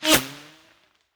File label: bullet by subsonic; weapons; nearmiss; Counter Strike Model Pack; CS2